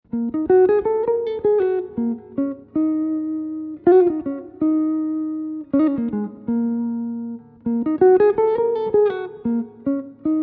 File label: Melody Ebm, jazz tunes